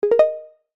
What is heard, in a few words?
notification